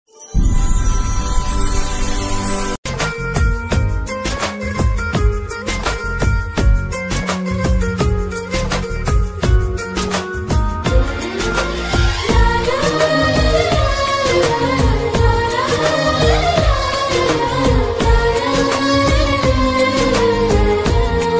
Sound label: kannada ringtone song love